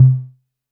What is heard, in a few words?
vermona drm1 mk3 tom